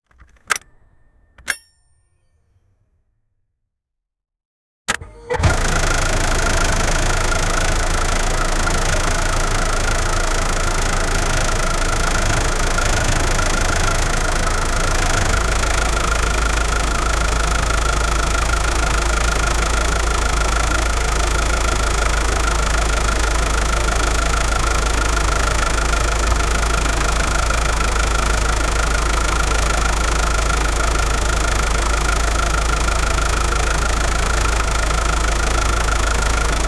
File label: volvo b230f idle engine; automobiles